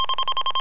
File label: SENSOR HIT; WOW